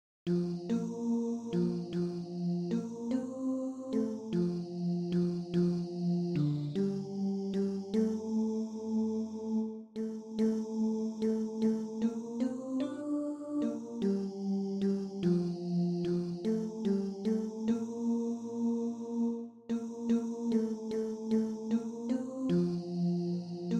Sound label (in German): Mittelstimme; Grundform 3 stg